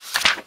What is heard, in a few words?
Page turn; Thalassophobia Restored